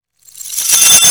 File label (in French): bris de verre